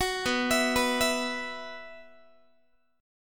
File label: B Suspended 4th, Guitar; Bsus4; arpeggiated; 21,19,19,21,20,x